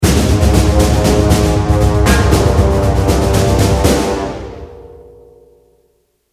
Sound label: lost round, music; Counter-Strike Global Offensive